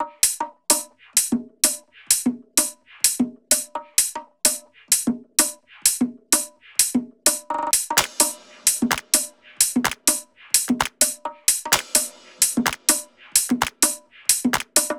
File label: loop top 128, Seismic Loops